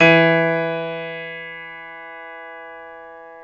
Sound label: PNO Grand Pno; Club-50 - Foundations Roland